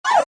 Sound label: Menu SFX: Go Back; Buttons; Multimedia; Stuart Duffield